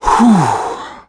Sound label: sigh; Cars